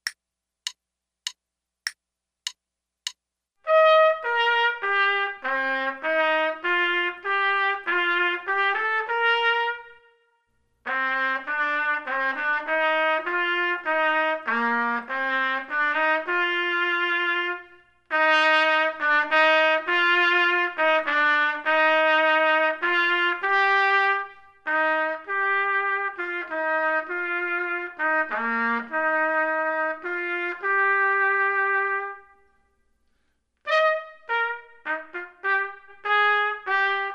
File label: trumpet; Selected Duets for Trumpet Podcast